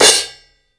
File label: CHOKE, cymbals